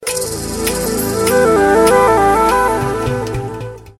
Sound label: Cool Sms Tone